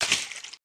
splash

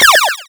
laser; Free SFX for anything

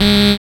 Buzz Angry; FREE SFX PACK VOL 2

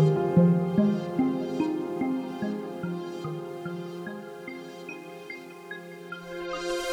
Arp Echo

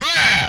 hit, vocal; MMRetroArcadeSoundsPack

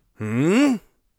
inquisitive, Warrior Voice; Warrior Voice Pack

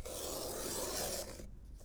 Cut Paper, paper cutter; Micro Pack

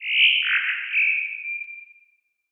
jungle, jungle ambiance

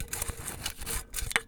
Small Can - Roll on Tile; Micro Pack

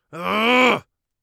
angry, Warrior Voice; Warrior Voice Pack

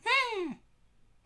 wah; abstract; Free Sound Assets

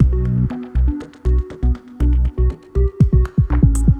Fun Polyrhythms

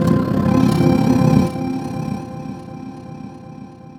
Stereo Power Up; FREE SFX PACK VOL 3

